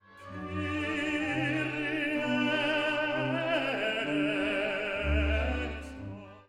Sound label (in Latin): Kyrie Tenor